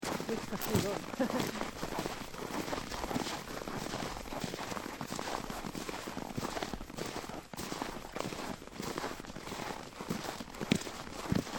marcher en groupe bois

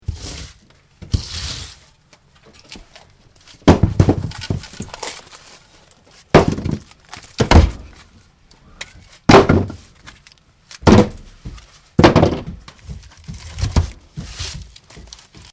rentrer le bois

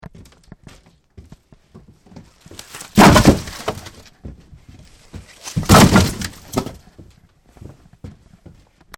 rentrer le bois

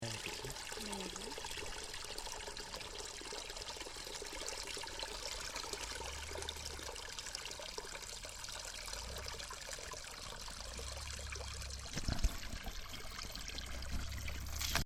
eau source

